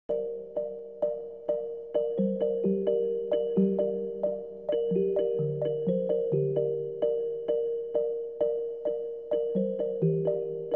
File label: ostinato; Myst III Exile